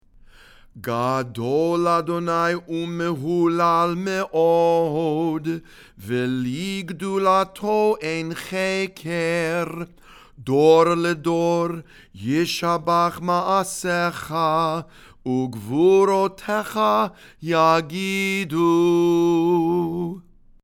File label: ashrei read and sung